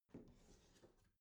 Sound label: bathroom cabinet open soft smooth